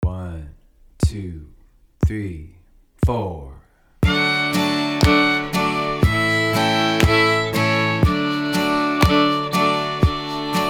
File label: Soldiers Joy